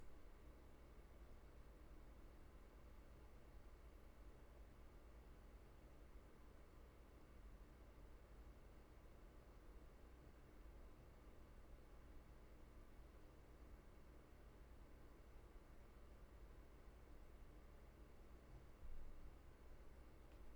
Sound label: roomtone